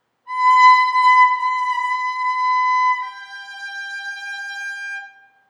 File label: Four Notes, Violin; Cheryl Violin Project